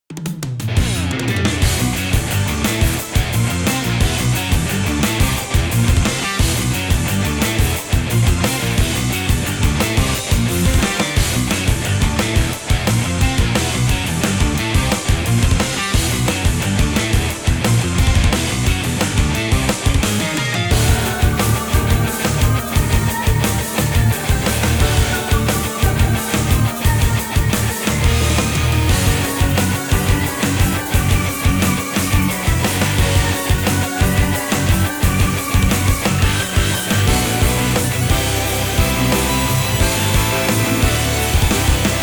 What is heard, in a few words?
Metal; Dan Dojo; Nijiiro 2020 Version; Sixth Dan